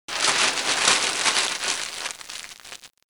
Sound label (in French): Page froisée